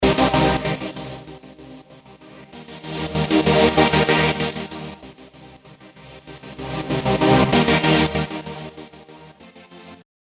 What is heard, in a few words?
With Cyclosonic Panner